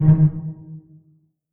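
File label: string pizzicato D#2 pianissimo left; Sound On Sound - Peter Siedleczek's Orchestra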